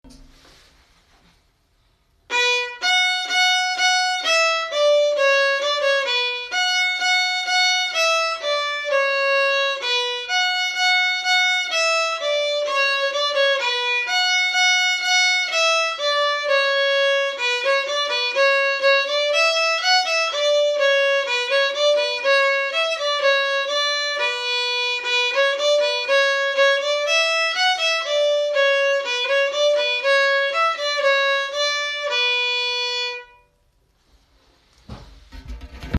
an dro bm melody, fiddle

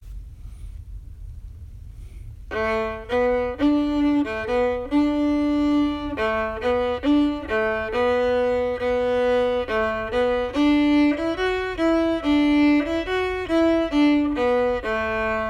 angeline low octave, fiddle; angeline the baker

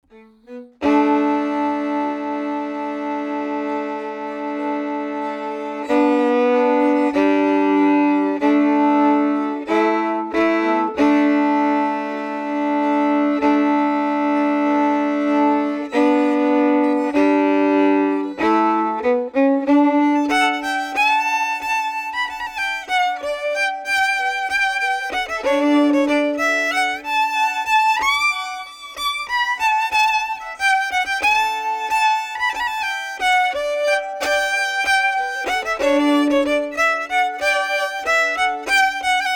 pete s march 3rd time harmony, fiddle

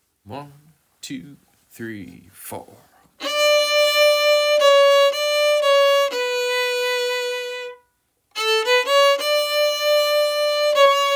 maison de glace pads, fiddle

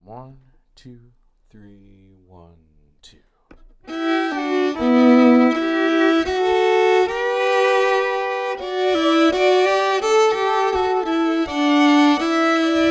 captain o kane melody plus harmony, fiddle

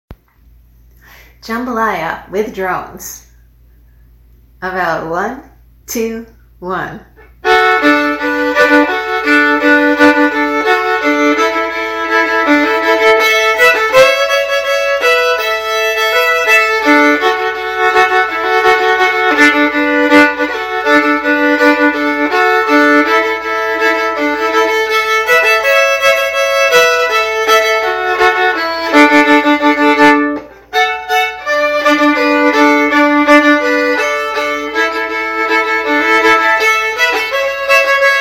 with drones, fiddle

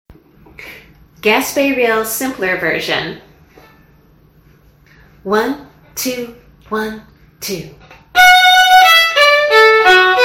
gaspe reel simpler version, fiddle

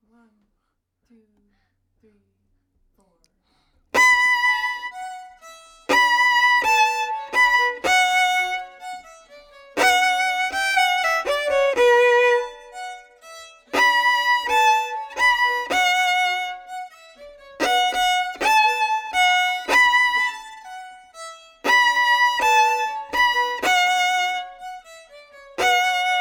pete s breakdown harmony, fiddle

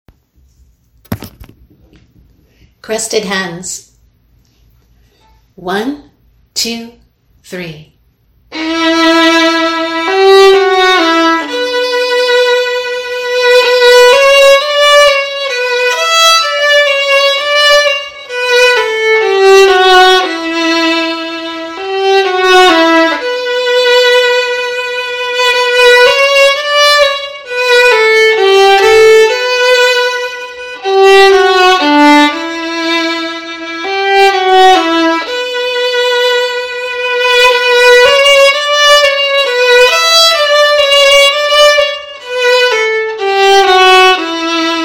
crested hens slowly, fiddle

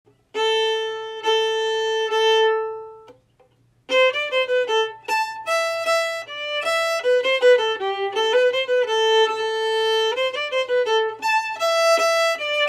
the cuckoo slow, fiddle

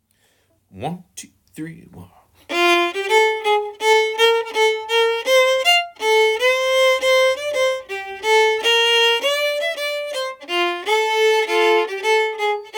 waltz quadrille, fiddle

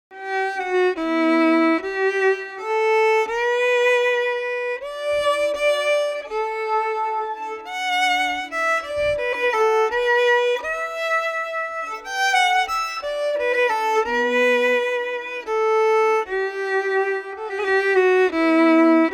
captain o kane harmony, fiddle

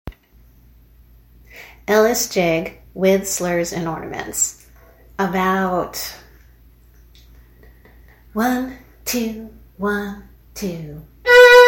ellis jig with slurs and ornaments, fiddle

